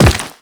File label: Step Rock, Footsteps; Characters; Warframe; The New War; Kahl